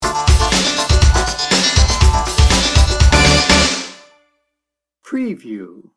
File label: 90s diva sting bumper